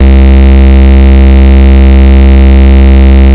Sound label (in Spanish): caja de musica saturado